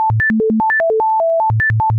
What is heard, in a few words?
robot, digital; Robot Beep; 2s